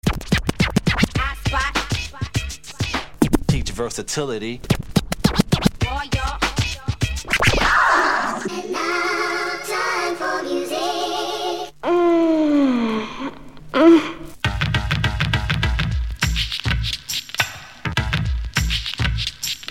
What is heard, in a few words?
Dance Mixes